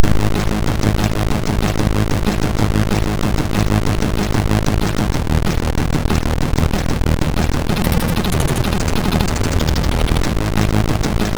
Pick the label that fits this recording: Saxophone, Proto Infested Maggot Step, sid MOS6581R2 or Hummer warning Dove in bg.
sid MOS6581R2